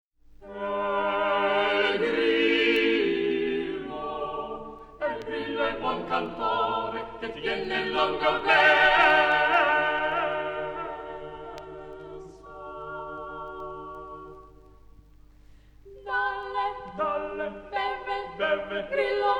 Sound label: Madrigal